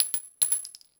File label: tungsten shell bounce hard